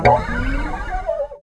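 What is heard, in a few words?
GUI Rutsch rauf, acoustic guitar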